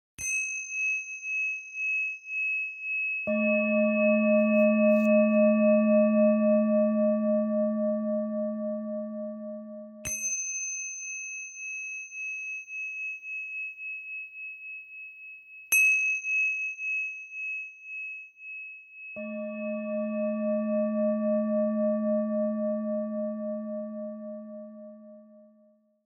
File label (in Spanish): Cuenco tibetano original Nota MI Relajacion meditacion